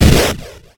damage

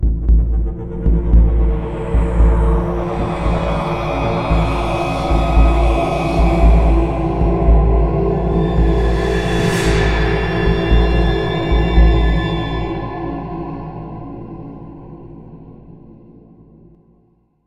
Sting Fear; Mass Effect

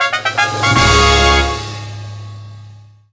Jingle ~ Challenge Clear!; mario super sluggers; wii

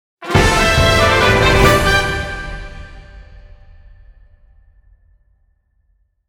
Fanfare (level up); fire emblem echoes shadows of valentia original soundtrack

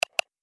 Mouse Clicks

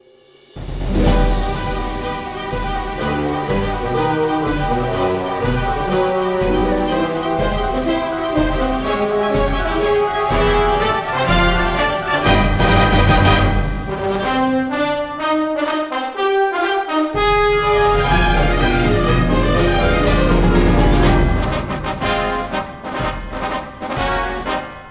Battle

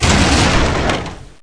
break door Metal

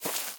Player Walk footsteps grass Walk; SFX

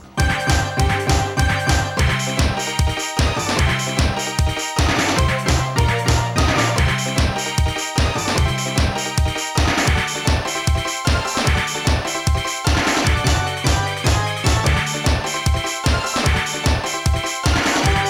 Warning BGM ~ Tie Jingle; Namco Video Game Graffiti Volume 10

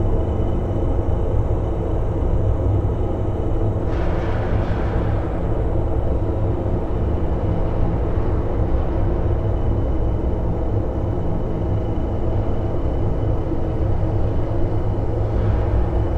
Machine Room; hidden deep windows gamerip 2022

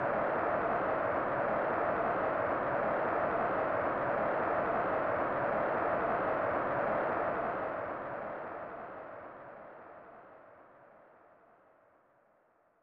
Anxious Crowd

